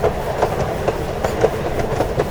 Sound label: TRAIN Steam Train Onboard Outside fast loop mono